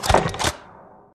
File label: lever sound; jingle spin